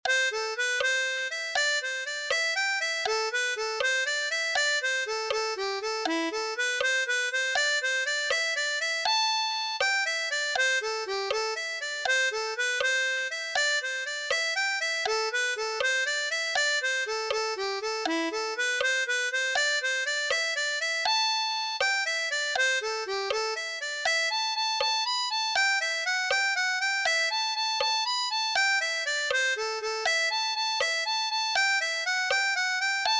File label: the monks jig; SlowSession; Jigs